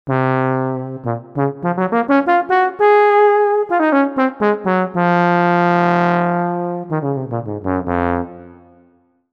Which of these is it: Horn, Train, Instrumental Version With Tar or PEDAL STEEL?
Horn